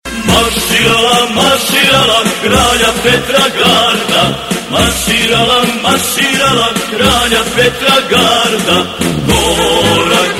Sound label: serbian march; ringtones